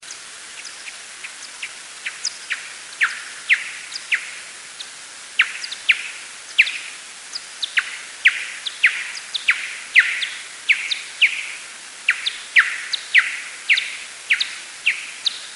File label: Social myotis, bats